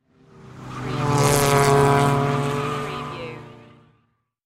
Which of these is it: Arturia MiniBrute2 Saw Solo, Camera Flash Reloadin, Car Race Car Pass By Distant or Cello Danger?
Car Race Car Pass By Distant